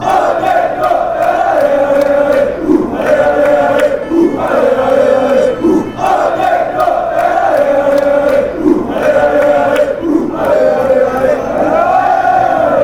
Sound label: uh, fan chants; lyonnais